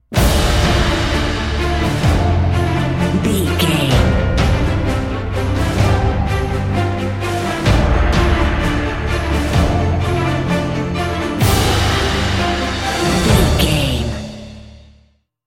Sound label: awakened epic cinematic